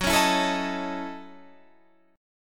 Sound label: F sharp Minor 13th; F#m13 strummed; 14,16,14,14,16,17; chord shape